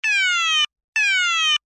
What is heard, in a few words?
alarm tone, sounder alarm tone; sounder beacon